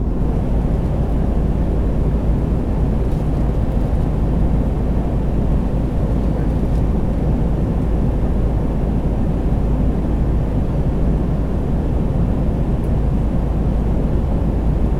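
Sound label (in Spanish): Fondo Avion, airplane background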